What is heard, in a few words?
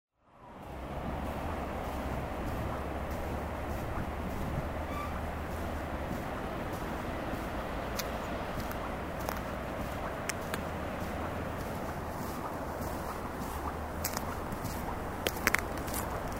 beach dymchurch